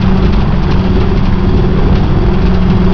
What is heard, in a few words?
engine running, Lockheed 1049; flightgear